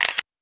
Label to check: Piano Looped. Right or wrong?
Wrong